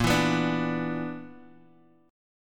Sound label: B-Minor Major 7th Double Flat 5th